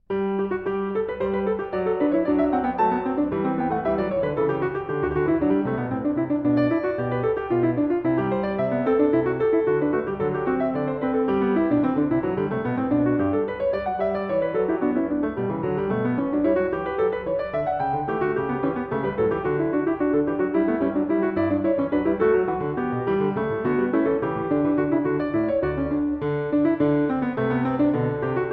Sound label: Goldberg Variations, BWV 988 Variation 12 Canone alla Quarta; Bach; Zenph Re-performance